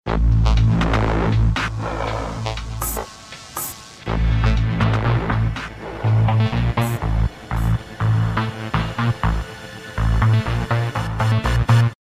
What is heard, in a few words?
Bass